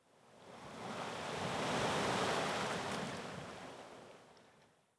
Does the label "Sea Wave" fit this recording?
Yes